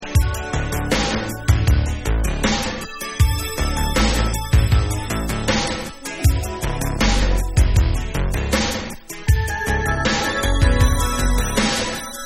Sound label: GROOVE Instrumental